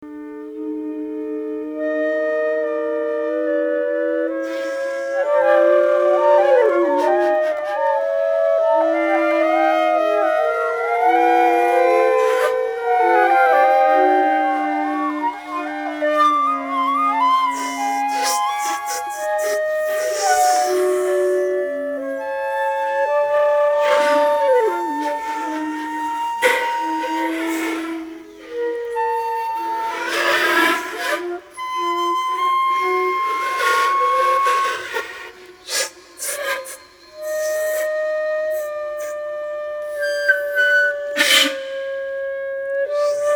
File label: TREE flute